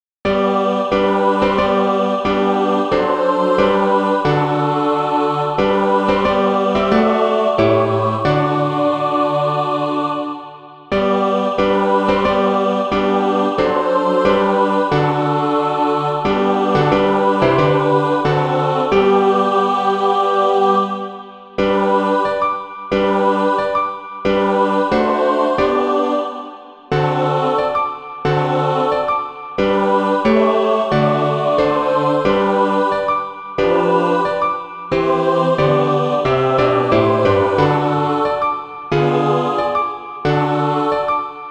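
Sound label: mixed, SATB Practise Music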